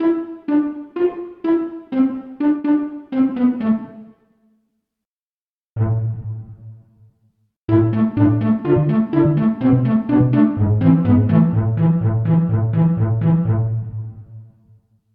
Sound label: pizzicato section